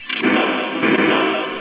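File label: lightning; chrono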